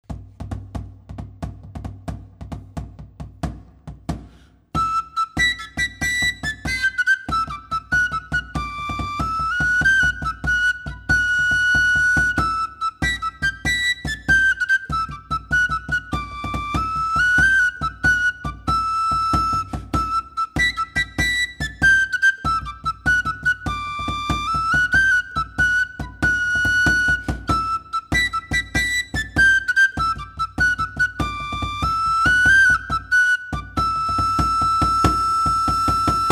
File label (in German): Trommel floeten beisp